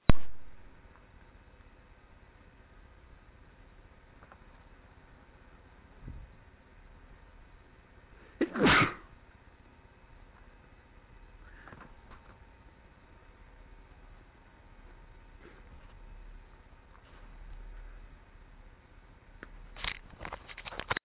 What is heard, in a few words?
stifle try, male